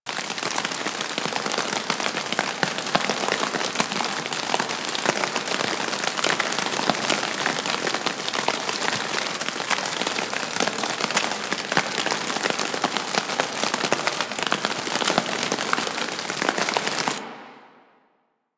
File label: Rain On Suit Loop; Ambience; Exterior; Warframe; Grineer Shipyards